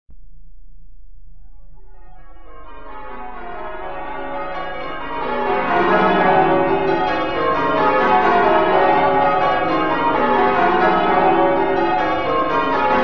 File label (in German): glockenst; sepulchrelondon